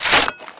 m203 slide out

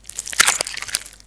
take foot

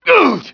damage

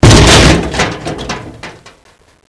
metal two

